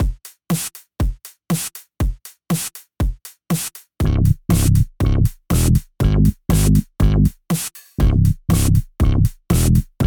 Future Impact low and high with nemesis shifter, bass